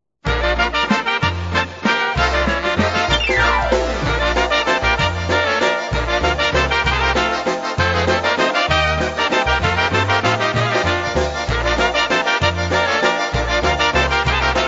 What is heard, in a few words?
oberek